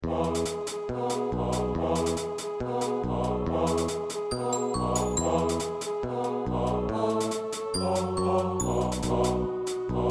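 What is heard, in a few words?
Bass, choir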